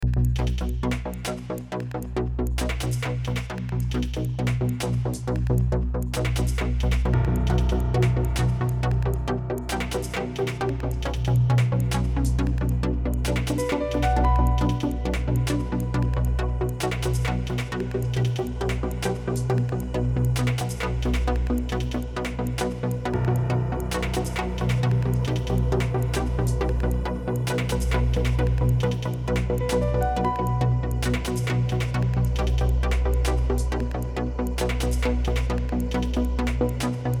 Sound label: Electro; Parfum